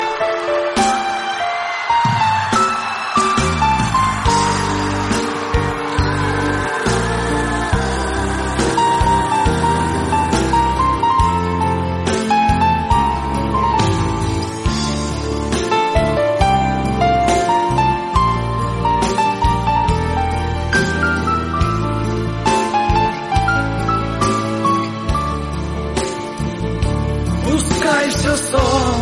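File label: russian music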